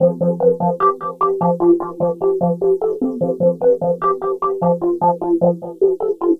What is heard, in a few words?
Seq Spooky, Drum & Bass; Sonic Foundry (Sony Creative Software); Knutrix Drum & Bass